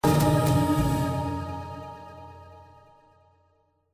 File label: anticipation end; Shamans Dream 2